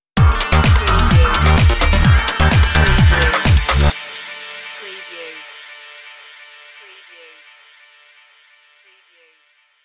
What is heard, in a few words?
stiliobeat stinger